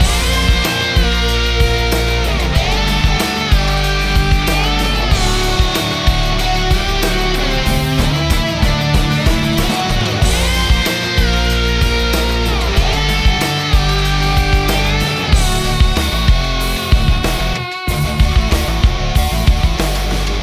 Indie Rock

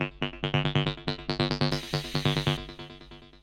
Nu Skool Trance 303

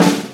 Ludwig Snare Roll

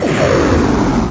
Monster Breathing

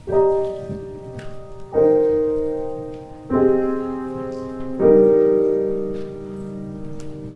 Grand Piano Chords

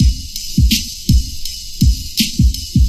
Muffled RnB Beat

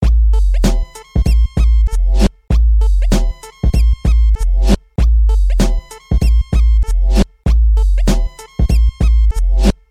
Hip Hop Instrumental

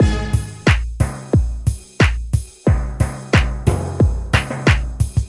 House Hop Sound

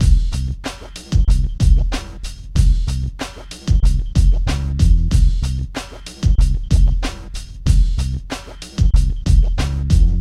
Hip Hop Tight

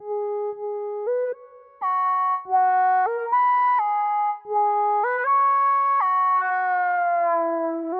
Indian Flute

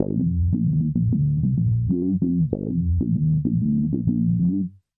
HipHop Bass

